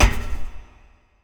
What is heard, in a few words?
foot thick metal